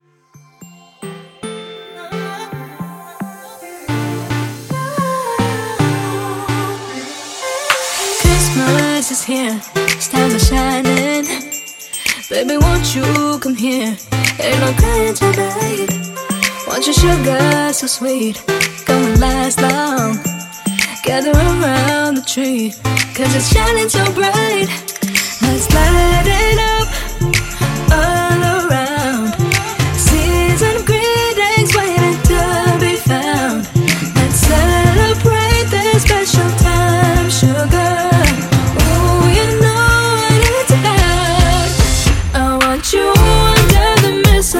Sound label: XMas Moombah Redrum; Remixfreakz